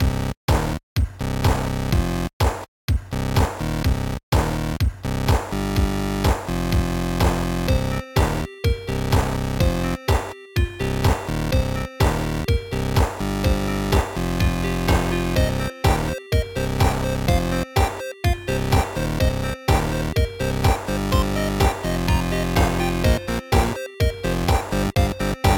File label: Menu; Rocksmith 2014 Edition Remastered; Scale Warriors